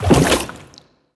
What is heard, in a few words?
jump; blastocyst